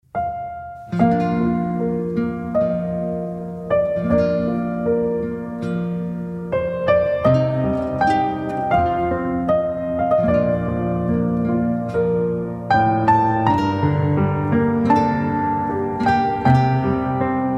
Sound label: Invention 14 in B Flat Major Piano Orchestration; It Is Well With My Soul; BWV 785